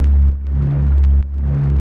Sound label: reese bass